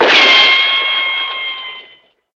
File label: continue Button Click; wild wild west